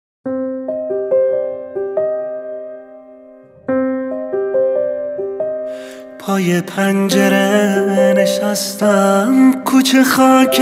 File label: Piano